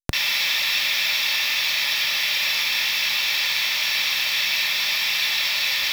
noise full volume; Treble loss with volume roll-off

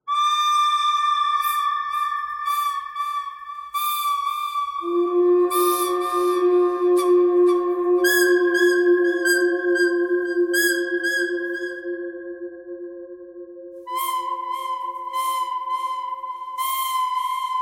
bird canyon bird thread harmonic; birds

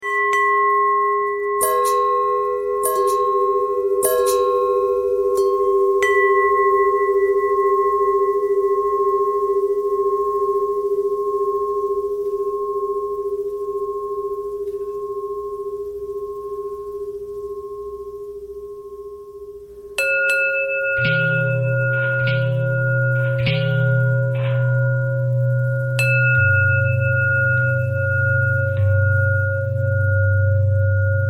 longbell bell bendthread

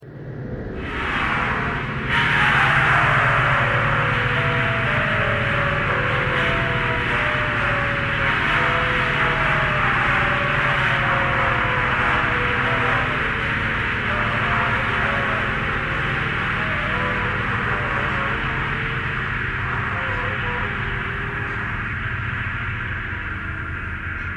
thread harmonic

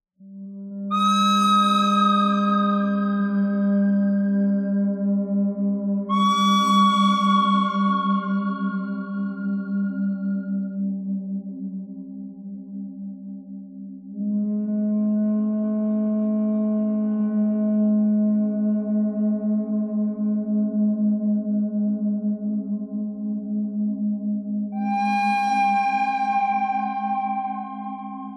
bird thread pentatonic; noreverb

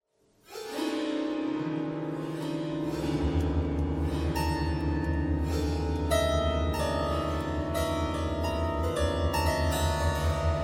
piano thread noise all

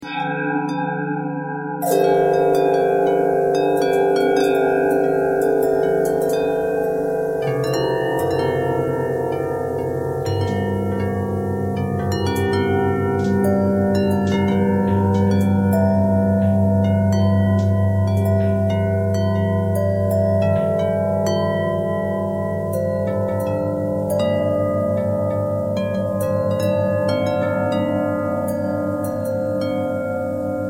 bell twist

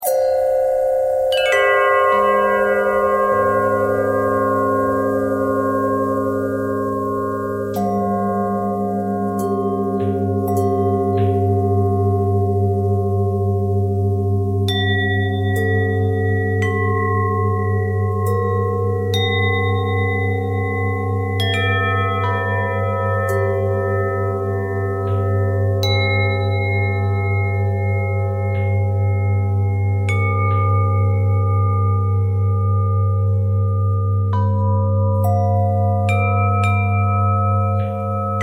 bell harmonic twist

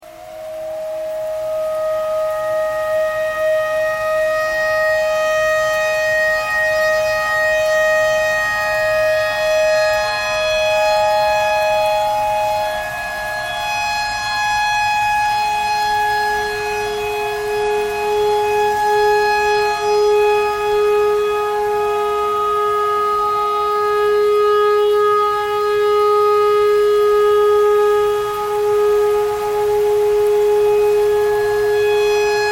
clarinet notes e tornado siren thread simple

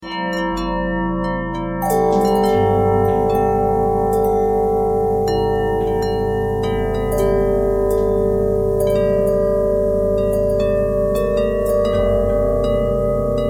bell twist

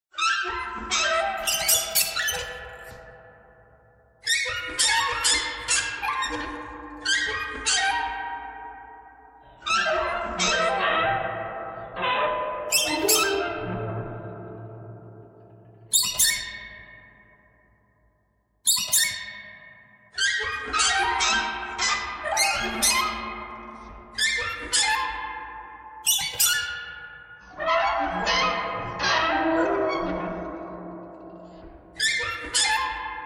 birds thread noise

